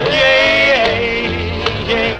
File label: yay SUNG